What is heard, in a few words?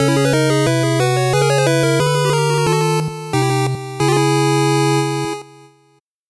8bit jingle normal